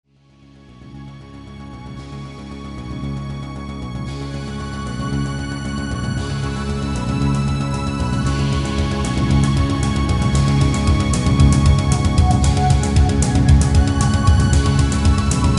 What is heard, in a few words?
tbass